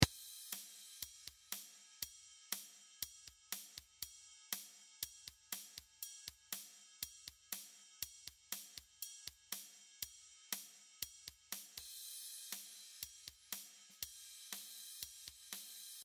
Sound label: Drumbeat